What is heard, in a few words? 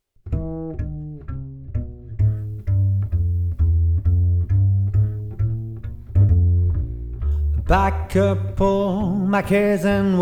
Combo, swing band